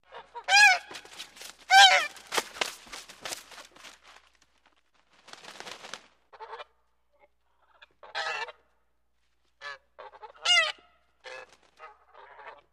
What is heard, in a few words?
Goose Honks Various, goose